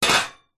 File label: metal frame short sharp bash hit; foley